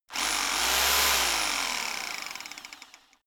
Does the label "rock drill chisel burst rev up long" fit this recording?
Yes